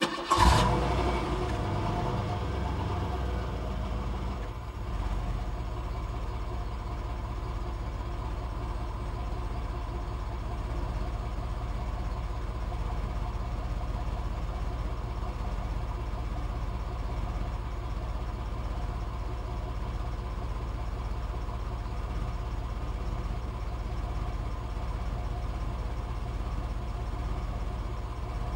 Cadillac 1990 Exterior, Idle, Shut Off From Rear

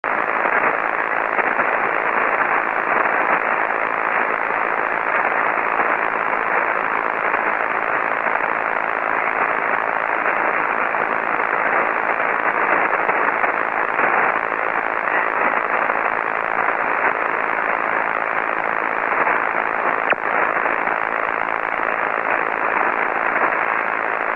Static Short Wave

